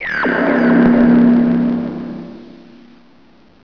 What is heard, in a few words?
Teleport; teracide